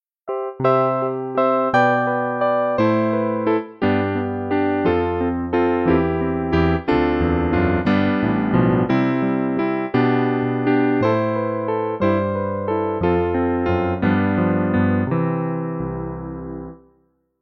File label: piano